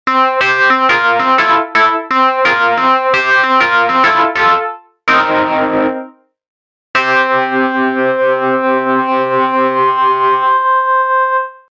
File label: Memory tremolo, moog